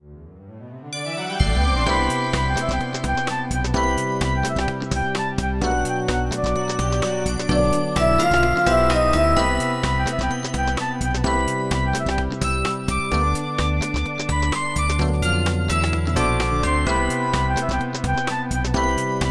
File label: LOOP; card captor sakura sakura chan to asobo; playstation 2